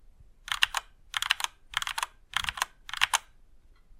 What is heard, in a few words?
Standard Keycaps